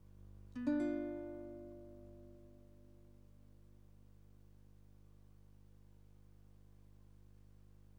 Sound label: guitar